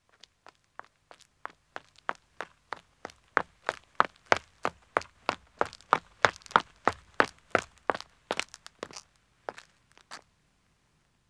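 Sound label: Country Road Running man approach, Footsteps; BBC Sound Effects Library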